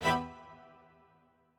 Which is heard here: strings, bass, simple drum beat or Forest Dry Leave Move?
strings